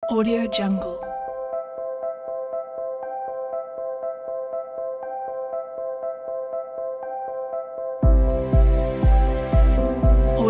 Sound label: soft inspiration motivate